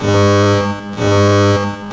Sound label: alarm; caged